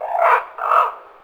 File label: raven